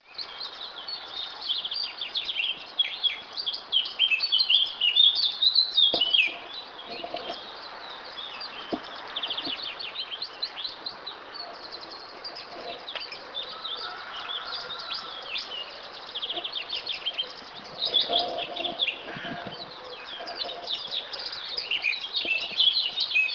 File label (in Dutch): vogelzang